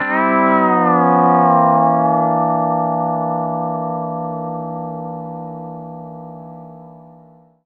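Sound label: pedal steel chord C9 L; PEDSTL CHORD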